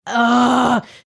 groaning

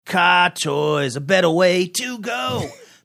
doing jingle; car toys

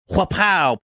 whapow

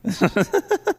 cute laugh

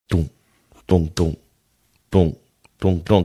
laying down kick drum beats

